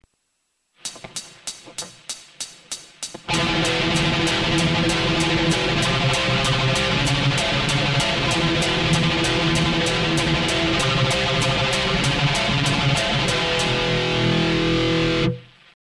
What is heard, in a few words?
thrash